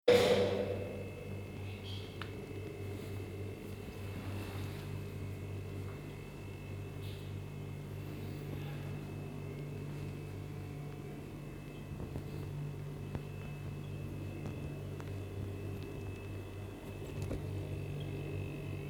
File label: curacao field recording; weeklybeats-2020